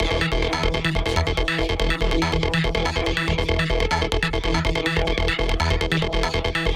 Dove E 142 BPM, Psytrance Arp Loops

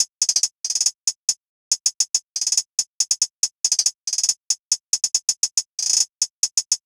Hihat Loop - 140 BPM, Hip Hop; Drums - Loops; 9God

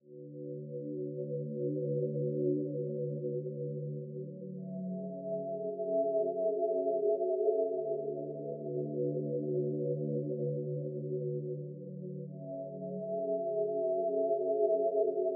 125 BPM F# Min Pad; Loop Stems; Cymatics; Only You

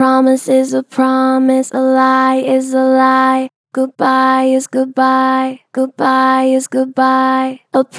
Pitch Flat Gentle, Vocals; Promise is a promise; Harmor Vocals

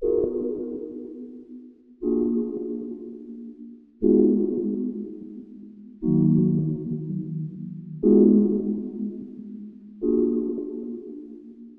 120 BPM D# Min Pad; Loop Stems; Cymatics; 2022 Melody Mystery Expansion; Slowly Drifting

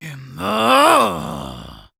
Yells, Viking Vocal

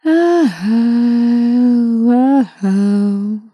Ah Ohoh, Vocals, Dry

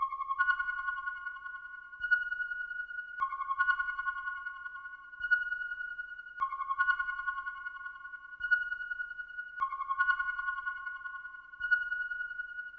150 BPM B Min MemoryMoog FX, Synth; Loop Stems; Cymatics; Fantasy Synth Sample Pack; Universal

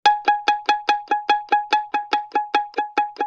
Pizzicato Loop; Musical Elements

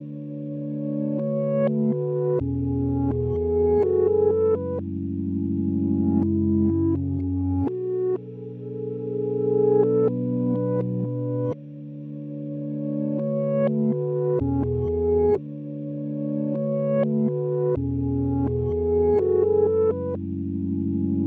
125 BPM F# Min Organ Reversed; Loop Stems; Cymatics; Only You

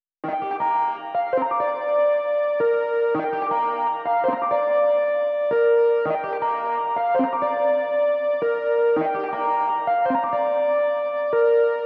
165 BPM G Min Lead, loop stems; Melody; Cymatics; 2022 Melody Collection - Wavy; Painless

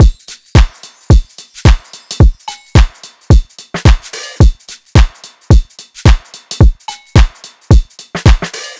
Drum Loop - 109 BPM; Bop; Cymatics - Infinity Beta Pack 2.0; Infinity Drum Collection

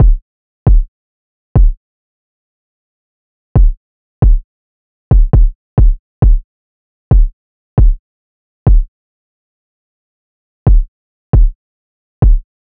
Drum Loop - 135 BPM Kick; Loop Stems; Cymatics; DOPE DRUMS VOL. 1; Chemist Drum Loop

